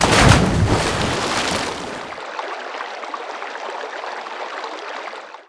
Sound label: aqua bomb splash